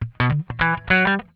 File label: SWING IT, Funk Guitar; Zero G - Funk Guitar